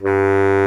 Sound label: BARI SAX G2; Greytsounds VOL-1